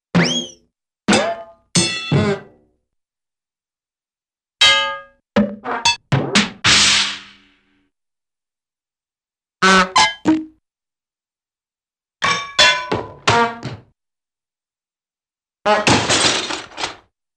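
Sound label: Kick, Fly & Tumble with bell, Drum & Horn - Bonk - Honk; Cartoon; Hollywood Edge; Cartoon Trax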